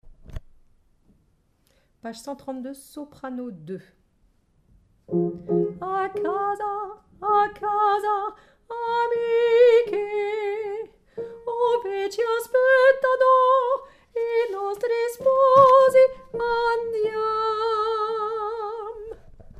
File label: Soprano